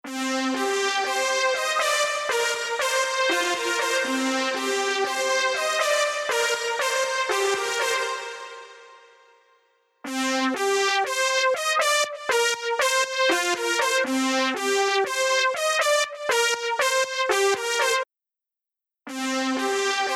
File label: Synth Lead Reverb; SP2016; Dave Pensado